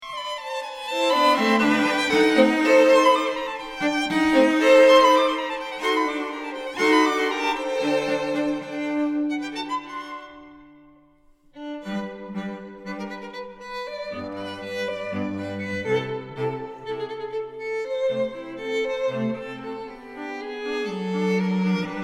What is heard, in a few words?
trio